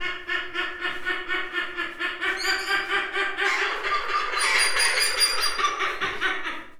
egymás felé madárral, trombitás szarvascsőrű; debreceni zoo 2012

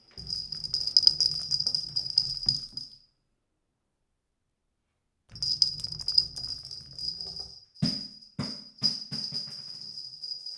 oda-vissza, földre leesik, csörgőlabda játék asztalon gurítás; Perkupa

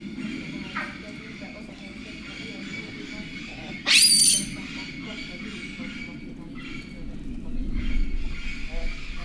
hibátlan, galléros pávián; pecsi zoo 2017

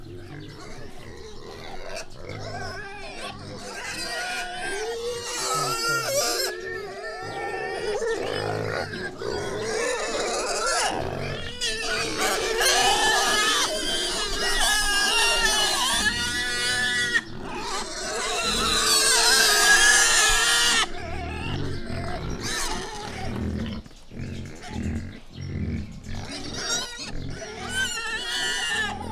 visítás is, vaddisznó; jaszberenyi zoo 2015

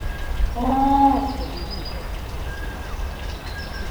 őrszem, pápaszemes pingvin; marantz puska; debreceni zoo 2012

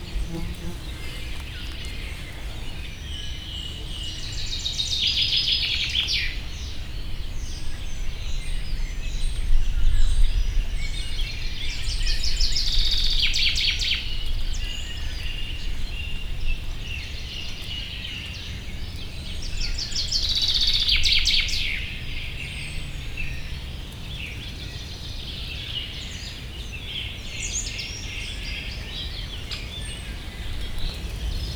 termeszet olen dravasziget; lakatlan sziget; cardioid

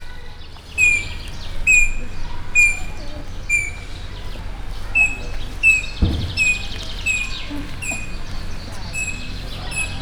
sipmester, rozellapapagaj; miskolci zoo 2018

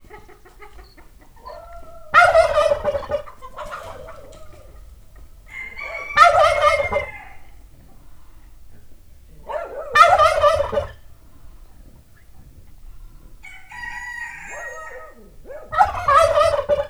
kutya is; parasztudvar; pulykakakas

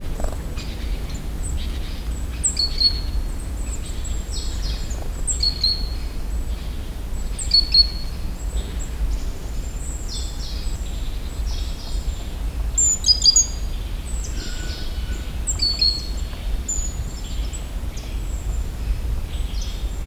egyik hangja, széncinege; termeszetben; allathangok

